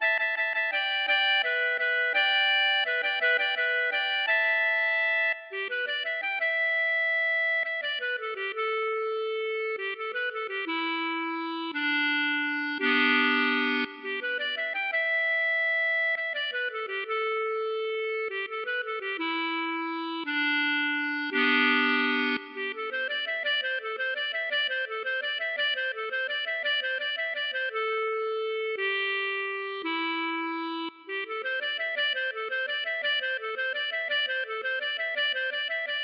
valse

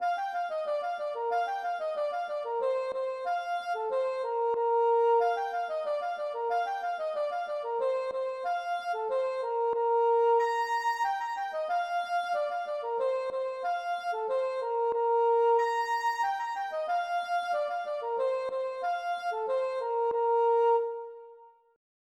polka suedoise

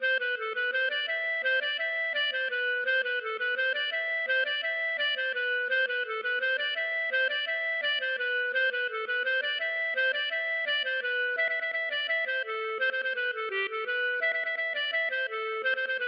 laride

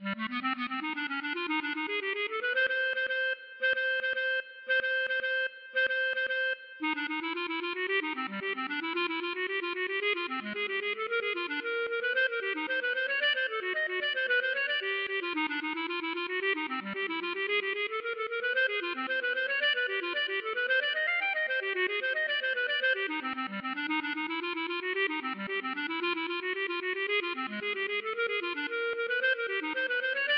samba Fantaisie

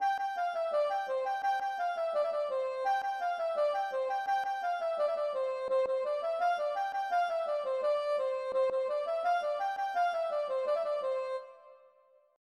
ridee

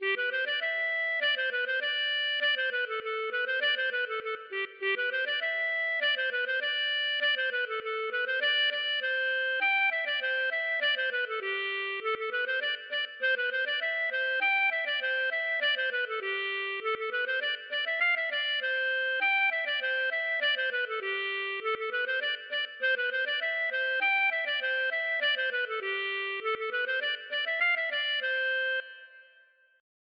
aeroplane; Repesse

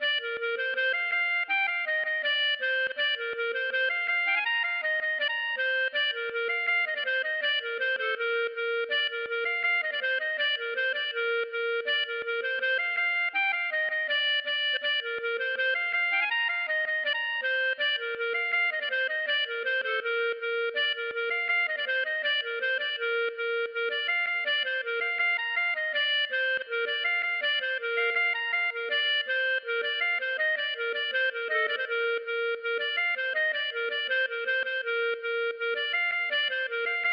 gavotte; Gavotte pourlet No2

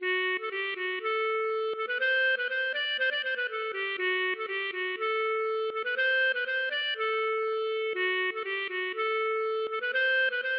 bourree; AvantDeSenAller

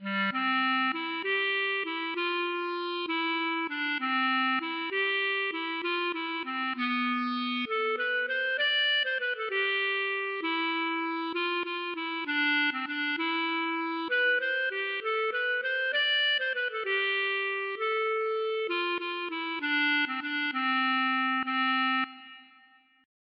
valse

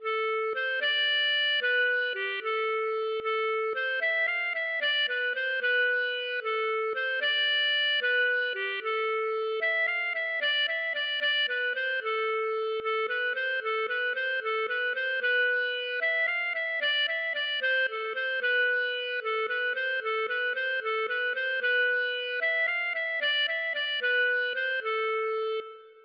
valse

